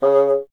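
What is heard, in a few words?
wind bassoon C#; Miroslav Vitous - Solo Instruments VOL-1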